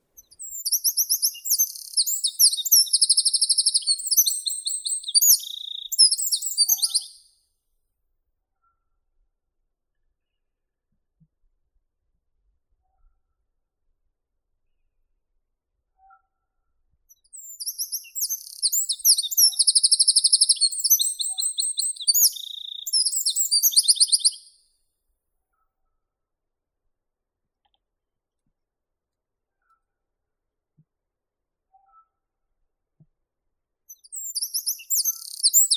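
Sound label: wren, Troglodytes troglodytes; krapivnik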